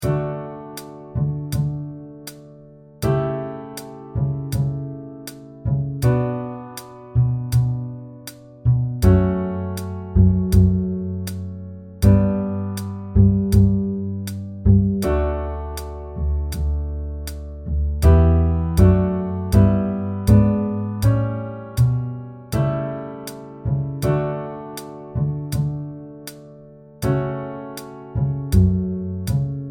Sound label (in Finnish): basso